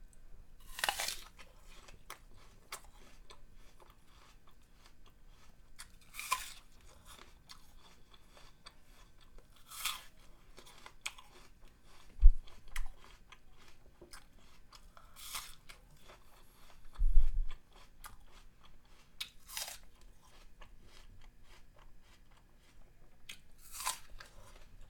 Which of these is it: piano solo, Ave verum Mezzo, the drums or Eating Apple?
Eating Apple